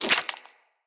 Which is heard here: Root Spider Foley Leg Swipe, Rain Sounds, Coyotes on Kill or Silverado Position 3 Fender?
Root Spider Foley Leg Swipe